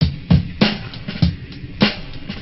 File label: dirty beats, drum loops